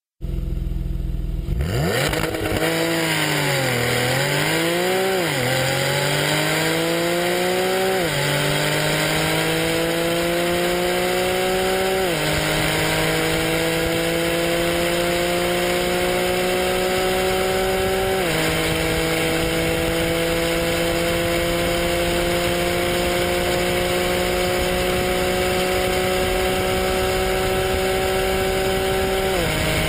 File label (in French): Harmoniques